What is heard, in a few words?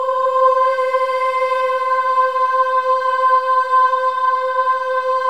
OH EH; Spectrasonics